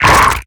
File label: Exertion Light; Evolve; Gorgon